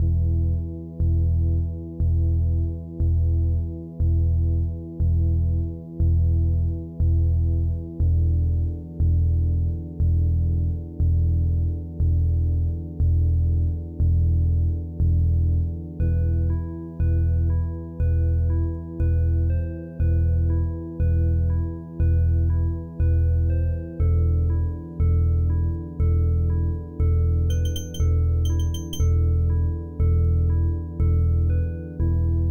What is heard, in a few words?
Victory Music; hexen beyond heretic ibm pc at